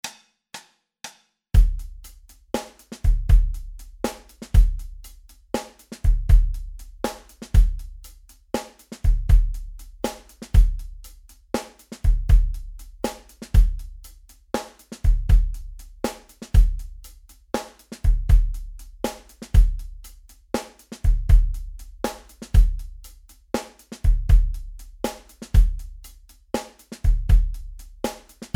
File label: Drums groove slap tempo 120; exercices intermediaires